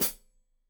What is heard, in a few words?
Hat Foot, Hi-Hat, Cymbals Stereo